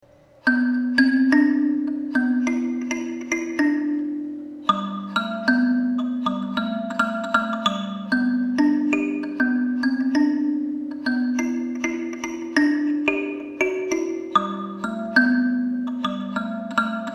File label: kalimba